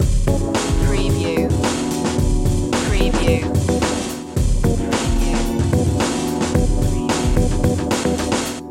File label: unwelcome gaze loop